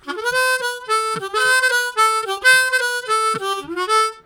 Melodic Walk, Harmonica; Zero G

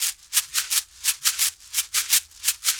Berimbau Shaker; World Percussion

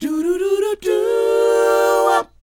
DOWOP C, Vocal R&B DOO WOP; ILIO; Vocal Planet

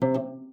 STOP B2, HARP; Xsample VOL-8; HARP+FX

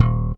PX SLAP D#1, Bass; Masterbits CLIMAX 7; PX BASSES

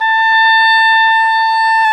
oboe, Oboe Brth

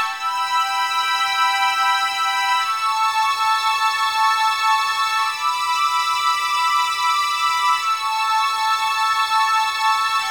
strings, analog funk

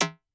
Perc Reso, Vermona Mono15 Drums

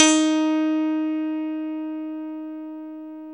KEY MKS, Pop Pianos, Rhodes; Roland L-CD701; Pop Pianos 3

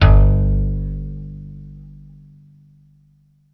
gta g1, EMU E-III Guitar 12Str

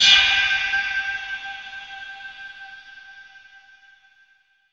pad g, synth pads; Best Service ProSamples vol.36

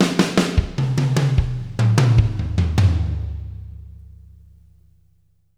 Fills, 075; Zero-G; Monster Beats

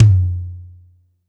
Low Tom, Standard Kit, Yamaha PSR-E323

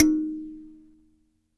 Dyna Sansa; Roland LCDP11 Africa VOL-1; Sansas

